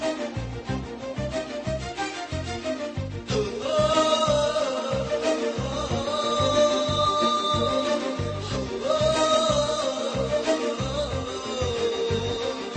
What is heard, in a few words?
BGM Humming Ringtone